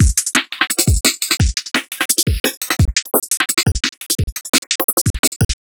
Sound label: breakbeat; wavefield